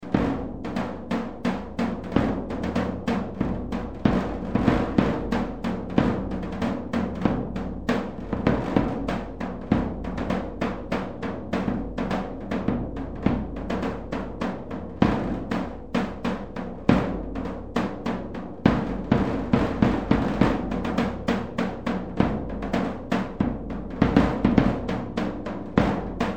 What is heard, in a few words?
Solo Daouli; tsiganoi